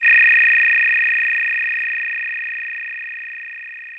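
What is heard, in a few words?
DX100 D#1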